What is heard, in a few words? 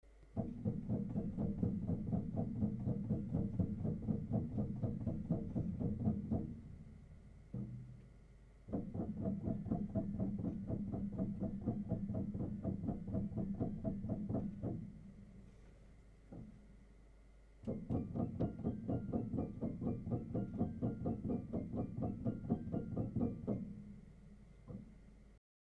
noise only